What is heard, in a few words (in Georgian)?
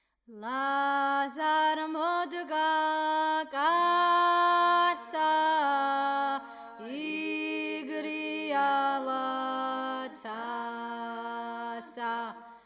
ლაზარე ქართლური მეორე ხმა; გალობის უნივერსიტეტი; სასწავლო